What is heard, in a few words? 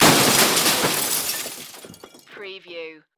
Crash Metal Glass